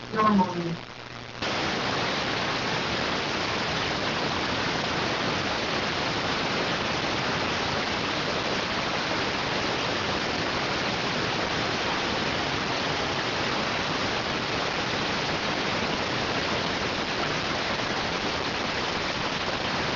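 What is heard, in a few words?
radioteletype receive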